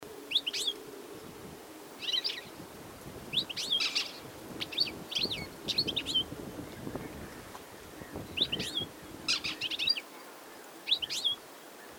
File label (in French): chardonneret elegant carduelis carduelis